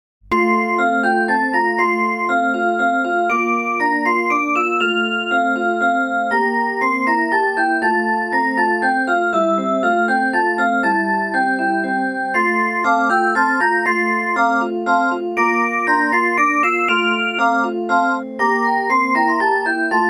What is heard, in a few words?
Menuet